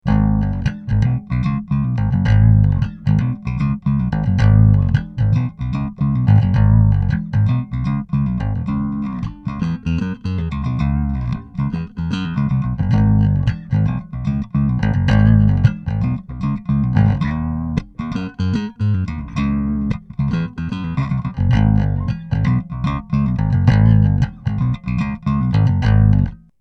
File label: riff